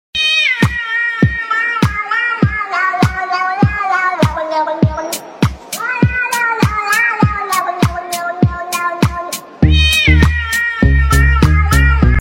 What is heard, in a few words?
cat dance remix ringtone